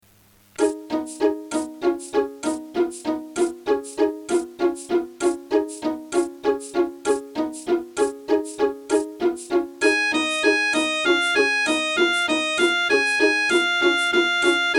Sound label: Nzakara Harp Songs; Brenner; Vienna 2007